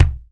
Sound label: kicks